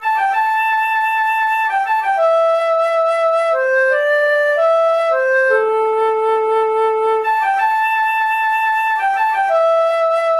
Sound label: Flute Song